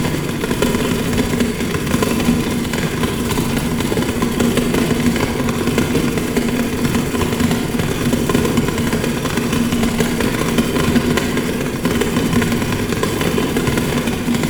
cogwheels; The Swapper

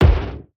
thud; CrossCode; battle

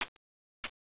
mining; Caesar 2; DOS

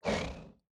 buffalo miss; CrossCode; battle

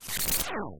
pop; World Of Goo

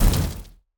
fire hit medium; CrossCode; battle

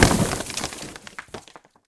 break; BlockHead; World Of Goo

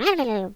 mumble; World Of Goo

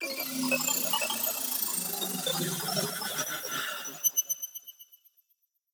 teleport out

